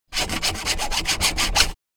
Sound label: Sawing plastic pipe handsaw squeaky